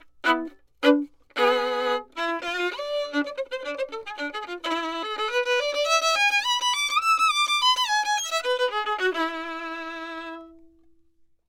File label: violin; lewitt; mtp740